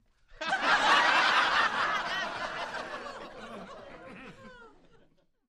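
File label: Crowd 50 Single Hearty, small interior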